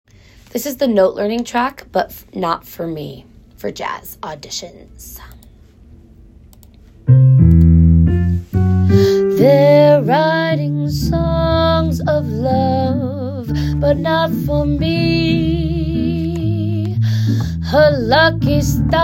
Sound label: Sung with Note Learning Track